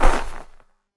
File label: flee; echoes-of-the-ash; Combat animations, flee fixes